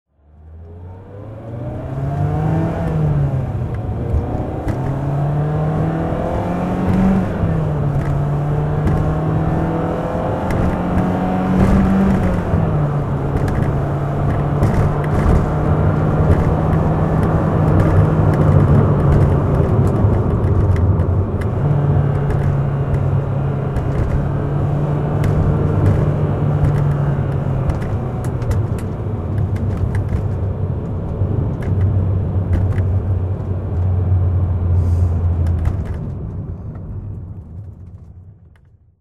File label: BMW E30 M3 driving